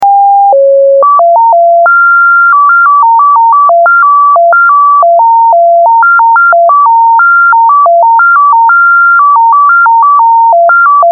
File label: cwid; pi4 cwid car